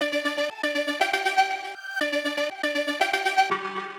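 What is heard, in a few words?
120 synth loop G major; lunar dancefloor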